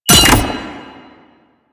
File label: Grenade Bounce; Lasrian Goonadier Grenade; Lasrian Soldiers; Weapons